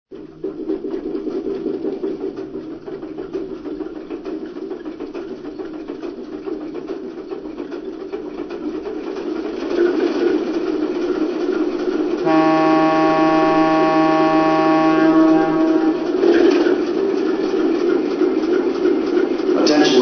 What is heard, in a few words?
Alco PA, trains